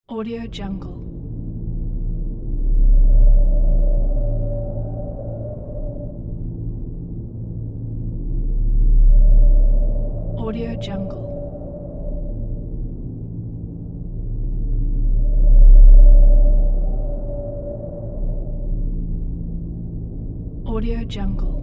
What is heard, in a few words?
spaceship hum